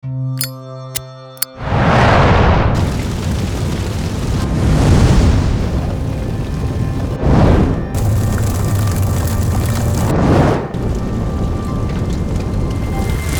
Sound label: Fire; Sound Effects Bible Fire; 67 Fire FX WAV Files